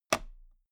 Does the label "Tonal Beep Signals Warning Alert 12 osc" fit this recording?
No